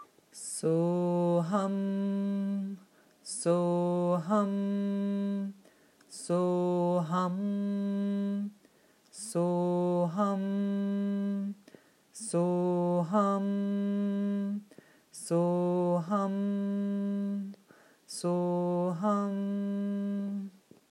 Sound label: so hum